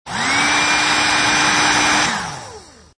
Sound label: Heat Gun Strong Short, Heat gun, short shot, high power (second level); Machines; Lynne Music